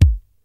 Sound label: round kick sound a key; kick drum samples